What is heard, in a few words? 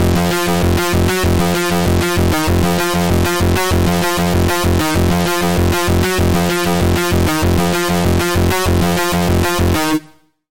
Lead, Sylenth1